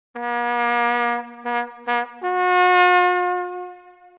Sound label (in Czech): zastavit, troubeni